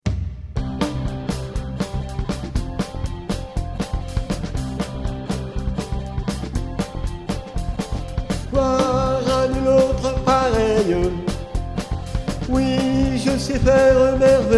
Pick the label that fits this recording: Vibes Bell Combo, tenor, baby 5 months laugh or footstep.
tenor